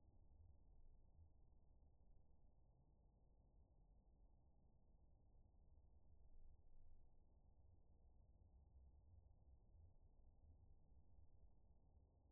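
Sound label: hydrophone; ICLISTEN HF6094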